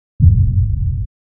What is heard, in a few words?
120bpm booms